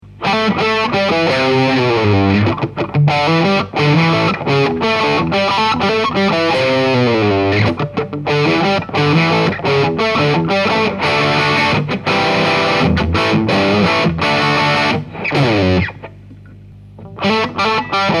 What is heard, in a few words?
tuning